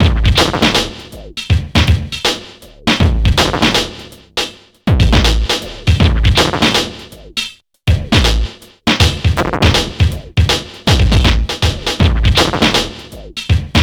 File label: HIPHOP; INIS; Funky Xtreams